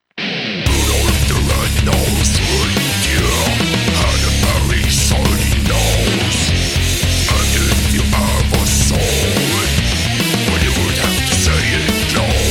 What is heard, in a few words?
Death Metal